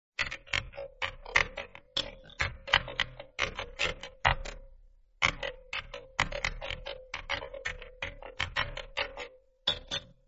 glitched type

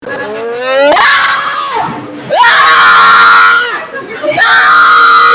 low quality screaming

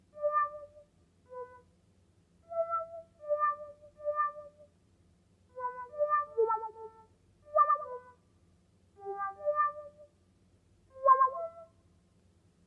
tremolo; tremoloid

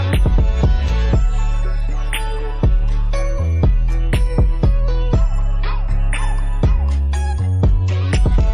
timer music

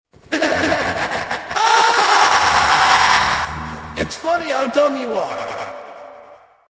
evil laugh

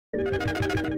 traffic light; lookouts

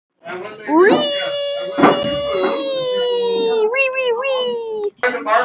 yeeeeeeeeeeee

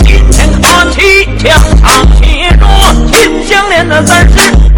chinese rap; meme

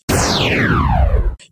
woosh

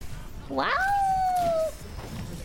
howl

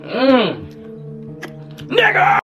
mmm n

